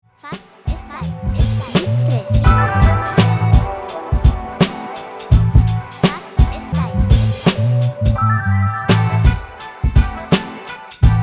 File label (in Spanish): Muestra Beat